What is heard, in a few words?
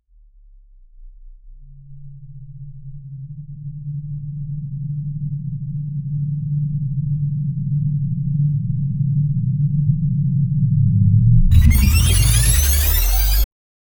futuristic bass heavy ene, energy